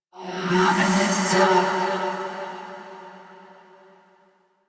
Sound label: Hand Compass Vocal; Warframe; Duviri